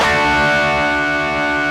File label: DIST CRD D1, DIST GUIT